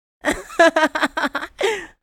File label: Laugh anime boy vocalization